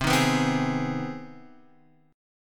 C Minor Major 7th Sharp 5th, Guitar, Half Step Down